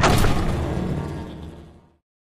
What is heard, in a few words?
crusher separate; Source Engine; Portal 2